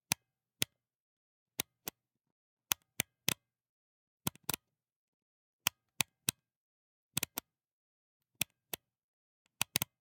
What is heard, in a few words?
Camera, Pentax KS2, DSLR, electronic, mechanical, mode dial, clicks, thick, various; Eiravaein Works; Vaeyan IV